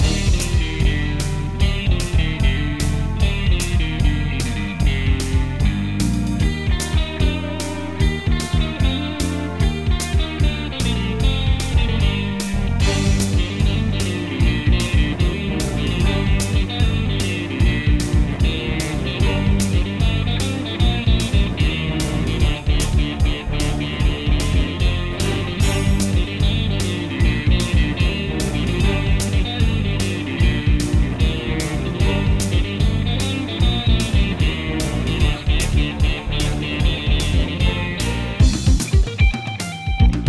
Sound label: Pirate; jett tailfin; wii u